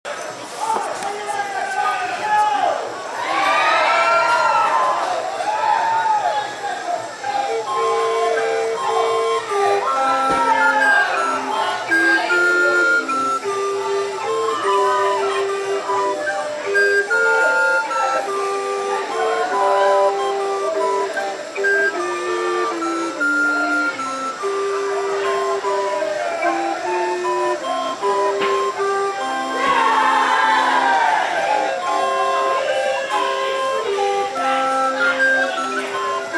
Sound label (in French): flutes a bec